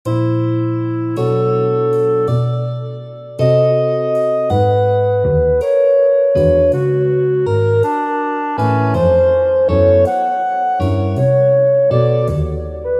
Clarinet; Combined Twins

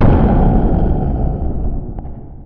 sfx death explosion

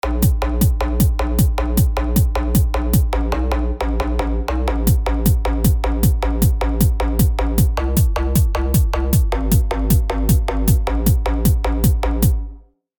hardbass; ruski

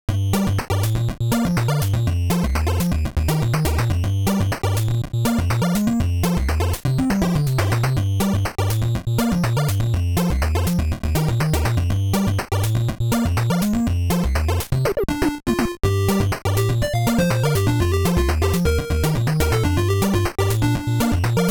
funk

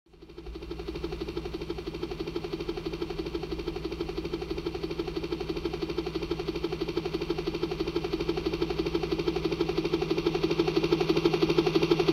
heli

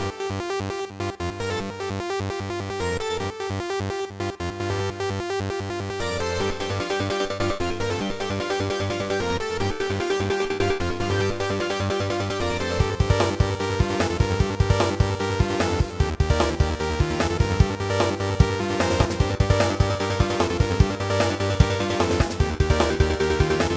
80s midnight rush

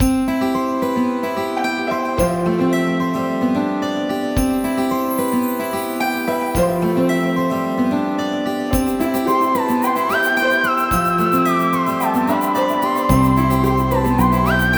Casual game track

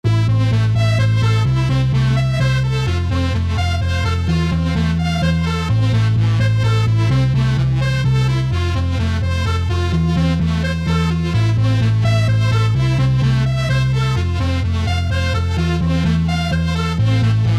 techno

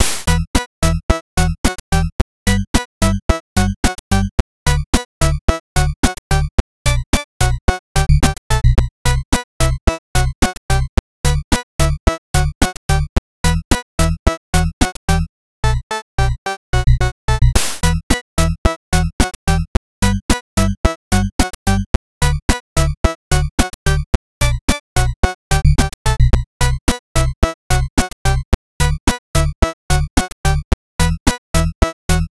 8-bit; The sixth column

